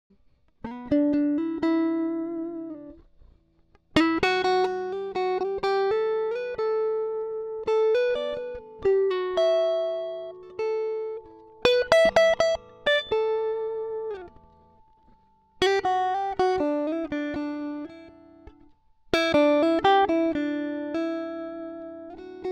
Em120 GTR IMPRO dry; Flavourtones

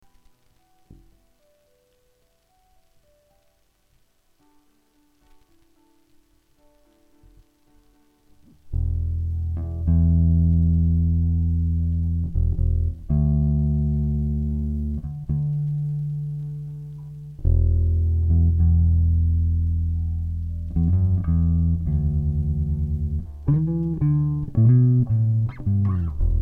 bass; admiralbob77

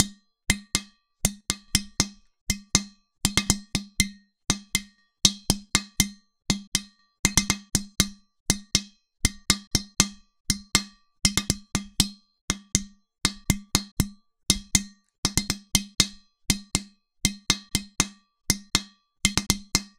Udu (Ibo) Hand; Udu (Ibo) & Cajon Drums

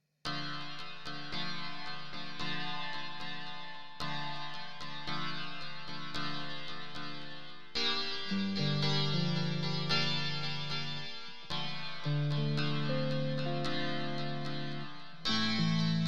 Dm112 Seven Four Groove

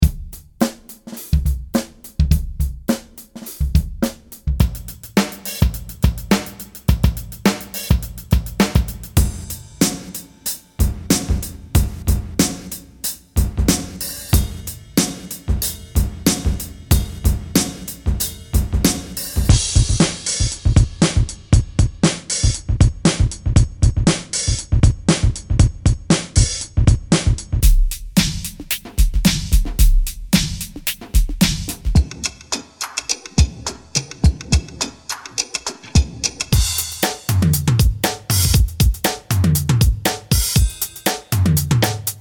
Funky Breaks; Volume Two

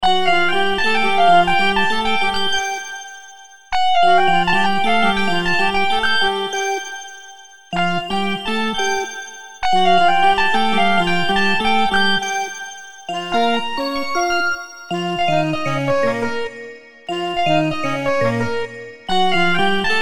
Creamy Sax Bass Bells (no percussion)

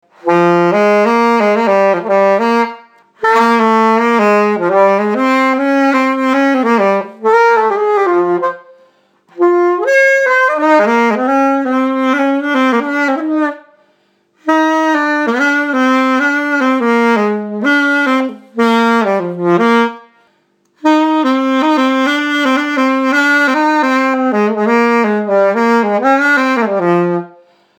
sax

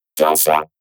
vocoder

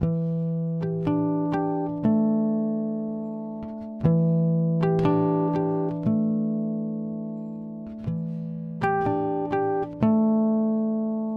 Em120 Flavourtones HARMONICS dry

